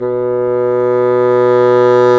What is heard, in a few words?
Bassoons